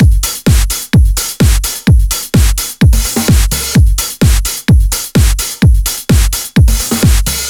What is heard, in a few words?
128BPM Big beat Kit; Vengeance Samples